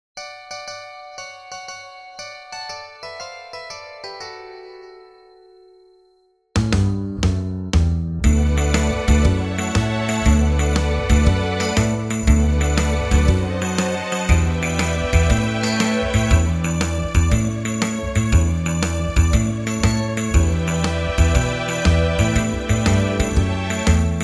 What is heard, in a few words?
Karaoke